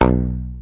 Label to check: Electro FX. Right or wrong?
Right